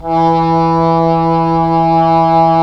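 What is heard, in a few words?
Cor Anglais; Roland - LA Composer vol. 4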